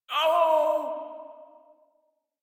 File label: Death, Barks, Battle; Warframe; Baro Defense